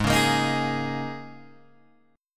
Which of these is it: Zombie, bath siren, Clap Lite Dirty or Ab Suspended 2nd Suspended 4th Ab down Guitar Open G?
Ab Suspended 2nd Suspended 4th Ab down Guitar Open G